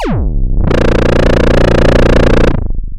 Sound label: Sound of a chainsaw